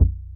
bass stabs, Drum Machines FutureRetro FR777

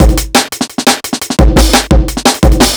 E Beat, Electronic Beats; KJ Sawka; Drum n Bass & Beyond

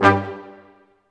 BRS, Staccatos, Ensembl stac; Roland L-CD702

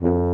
F2 TUBA

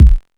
BD-Rubber, Korg Radias

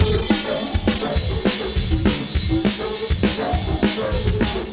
Beat Loops; Zero-G - Monster Beats

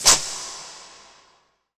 FX Dance

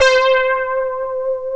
HIGH SYNT; East West - Global Sounds; SYNTHEX

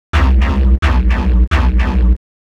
Bass loops; neuro; Optiv & BTK

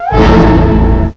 ORCH, STRINGS; STRINGS3

